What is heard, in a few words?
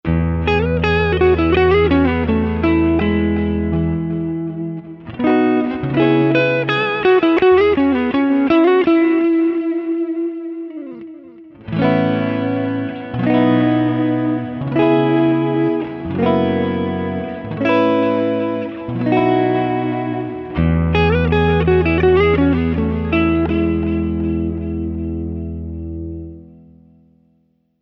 fender strat vox; yngwie malmsteen strat; time machine; fargen; retro classic